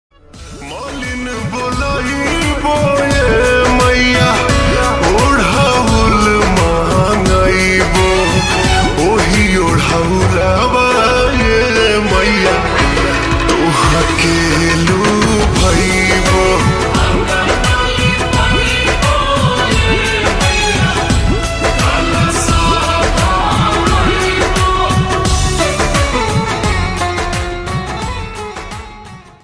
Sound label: Slowed Reverb Ringtone